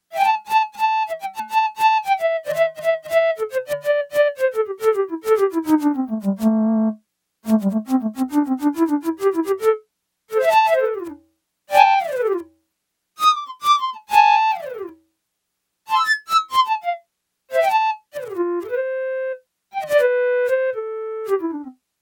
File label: Song Flute; Yamaha TX802; Factory Patches; Voices